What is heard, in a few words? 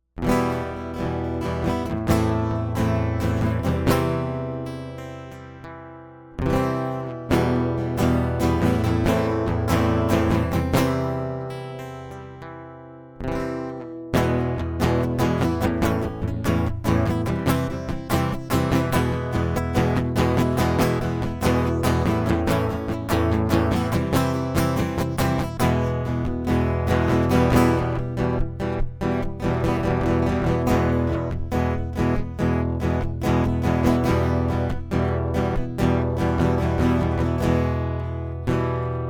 acoustic